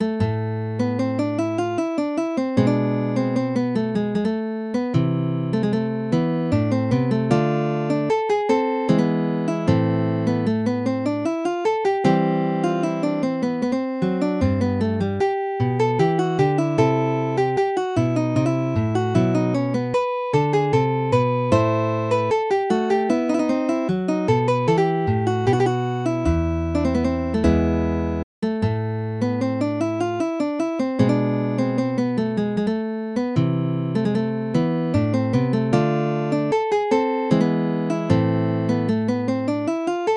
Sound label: lute suite courante; bach